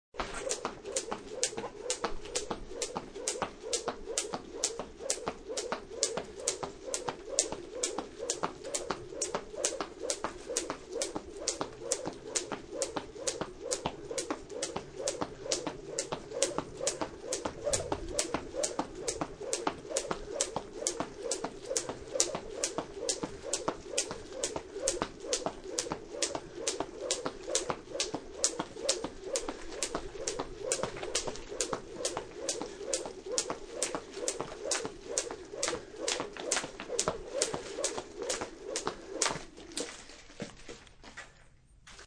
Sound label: Jumping rope; Exercising; Human